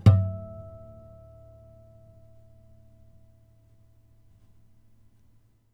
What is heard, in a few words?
harmonic, strings harmonics; 5 harmonic